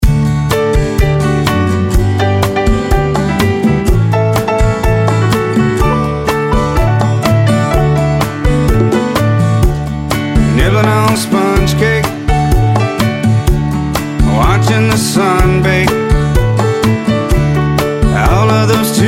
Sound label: SOLO PIANO